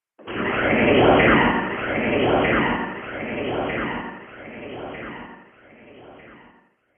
Scifi Afterburner, spacecraft

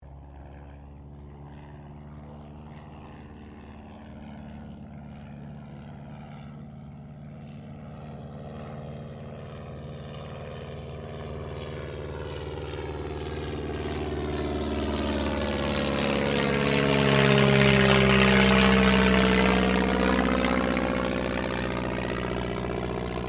AIRCRAFT DH82A DEHAVILAND TIGERMOTH PASS

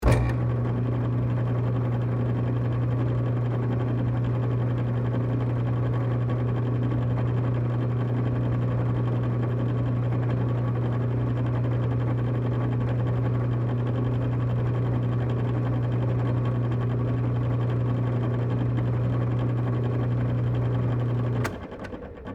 Drill Press Run, On, Run, Off; Drill Sound Effects; Tools; Blast Wave

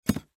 Pipe Drop Sand Tool Wrench; Blastwave; Foley

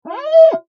Foley Glass Squeak Clean Window Single Short; Squeaks; SFX Bible

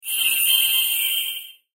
Swell Airy Musical; Sound Design; Blastwave

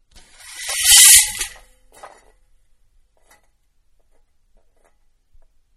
Venetian Blinds-Down-Fast; Windows; Household; Manhattan Production Music

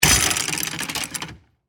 Chain Pulley Pull, Industry, Chain, Pulley, Pull; Factories; BlastWave

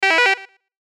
Synth Melody Short; Musical Sound Design; Multimedia; Short, Musical, Whoosh, Wah, Production Element, Imaging Element, Accent, Transition; Blastwave

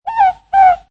Morepork, New Zealand bird; Exotic bird - New Zealand bird "Morepork" calling. Sound reminescent of an owl. (mono)

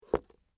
Dress Shoe Down, Set, Down; Foley, Shoes; BlastWave

